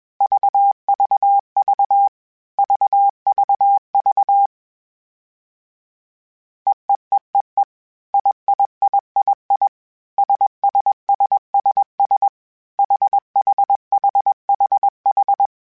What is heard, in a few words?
All Groups, Morse Code; HamRadio; Basic Sets